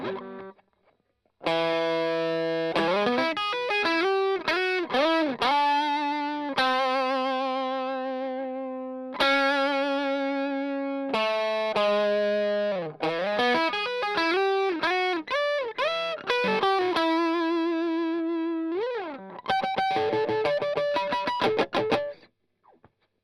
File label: Strum Line